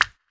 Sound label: menu; Alien Arena